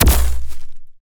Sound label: paper